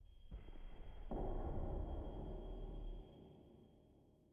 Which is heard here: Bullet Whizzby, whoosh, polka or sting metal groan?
sting metal groan